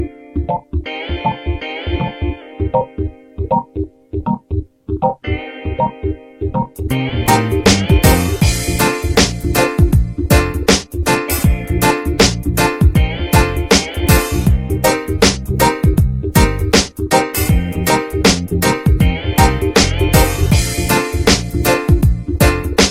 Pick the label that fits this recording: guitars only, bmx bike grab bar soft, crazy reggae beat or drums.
crazy reggae beat